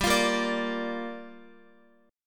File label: G Minor 7th, Guitar; Gm7 strummed; JGuitar